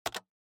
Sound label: PC Keyboard Enter